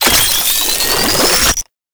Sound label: Pistol Alt Fire Charge; Weapons; Scaldra